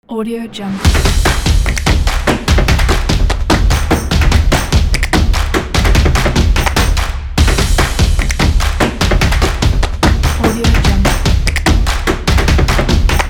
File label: motion graphics; positive-sound